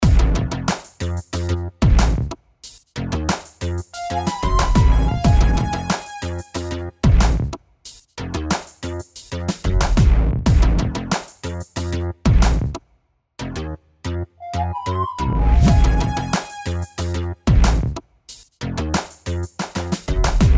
loop deep clap rhythm synth bend bass fx; songsnacks

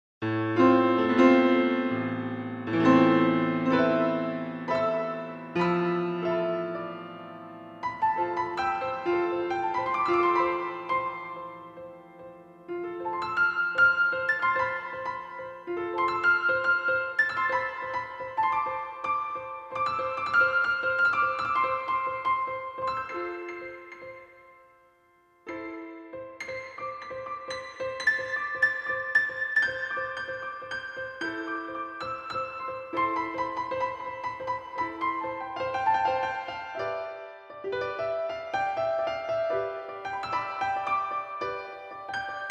improv piano

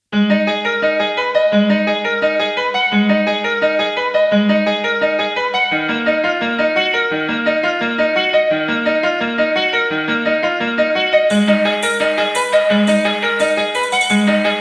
loop piano reso with drums; songsnacks

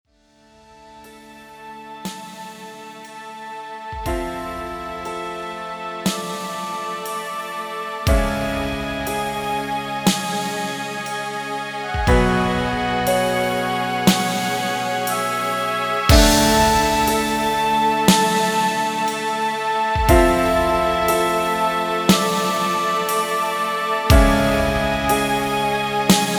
loop piano strings air slow drums; songsnacks

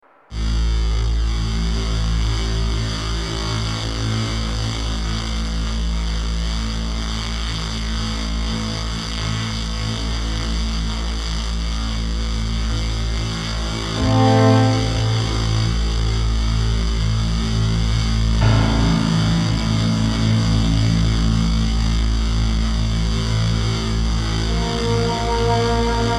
distorted bass piano cello scene; songsnacks

